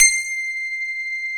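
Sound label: grand piano; AKAI S-Series CD-ROM Sound Library VOL-7; D.GRAND PF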